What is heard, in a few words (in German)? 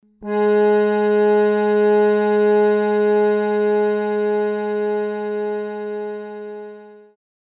modulation slight